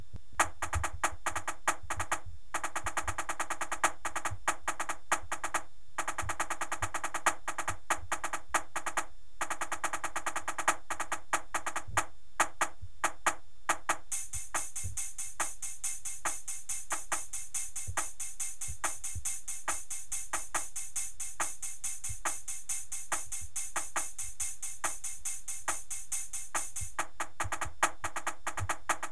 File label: snare, drumline